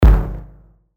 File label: percussion; Shrug; revealed percussion vol 2